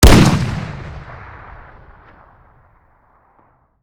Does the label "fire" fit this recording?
Yes